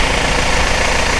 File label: bmw, Vehicles